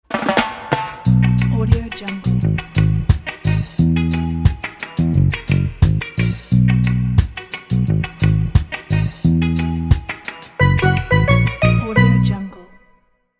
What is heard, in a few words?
reggae logo